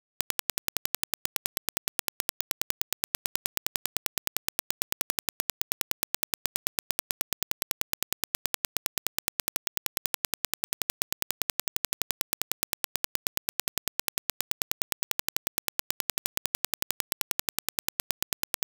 Impulse I (1sample) 0db L+r 100ms 20% (Random) 256times; Denon Audio Technical CD